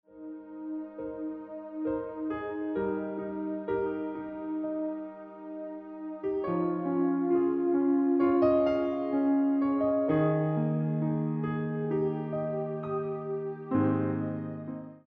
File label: Accompaniment